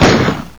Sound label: can opener Generic